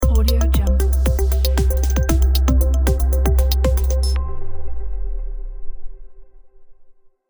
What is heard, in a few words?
motivational logo